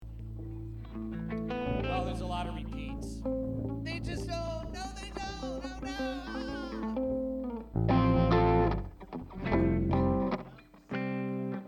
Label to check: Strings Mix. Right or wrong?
Right